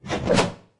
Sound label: shotgun melee, weapons